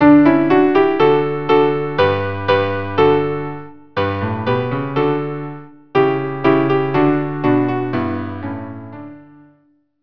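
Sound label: violin piano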